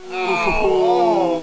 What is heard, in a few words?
Fall Fall; BREAKIN BATTLE